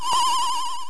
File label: pulse; MMBNMMOP